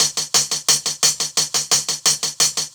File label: Hat 175, Drum&Bass Perc Loops; EZRollers